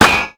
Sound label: bounce; gusanos promode